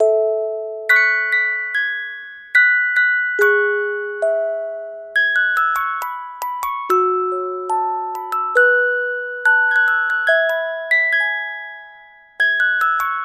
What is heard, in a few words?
keys music box; loops